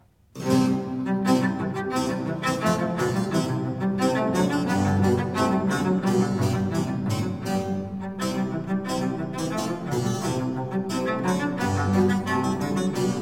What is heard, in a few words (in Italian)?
Sinfonia In Do Maggiore Presto, per Violoncello e Basso Continuo; Francesco Paolo Scipriani; Vito Paternoster; Sinfonie and Sonate del settecento italiano per Violoncello e Basso Continuo